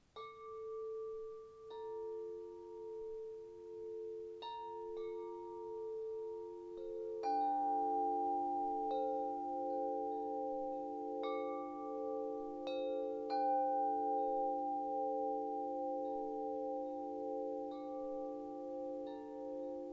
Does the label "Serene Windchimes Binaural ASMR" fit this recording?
Yes